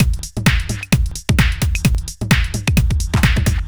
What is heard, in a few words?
130 Driller Killer Skip 2, Drum Loops 130bpm; Zero-G; Soundclash Nu Dance Electro; Acidized Wavs